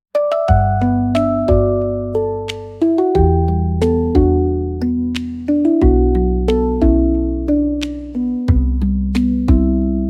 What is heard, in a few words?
90 bpm Asian Handpan Meditation; 49 sec; ai social media music